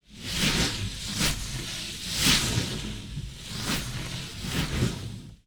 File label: Sliding Whoosh By; Coll Anderson; GDC Game Audio Bundle